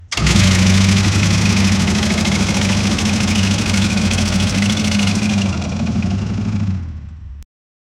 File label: tractor engine; engines